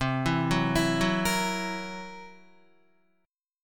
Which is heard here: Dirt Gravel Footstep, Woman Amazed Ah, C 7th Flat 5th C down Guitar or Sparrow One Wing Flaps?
C 7th Flat 5th C down Guitar